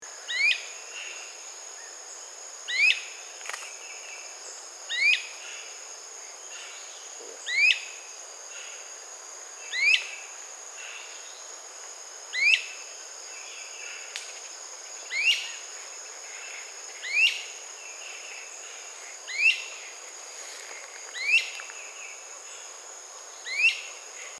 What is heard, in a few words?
Great-crested Flycatcher, Myiarchus crinitus; flycatchers